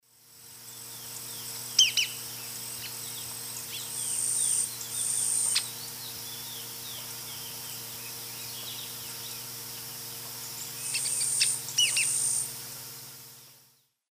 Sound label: Phaeomyias murina, calls; S. America; Eastern Lowlands